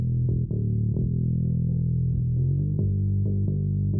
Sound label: bass; musdb18